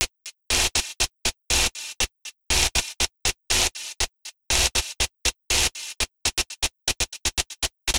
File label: Envelope Beat; Drums & Drones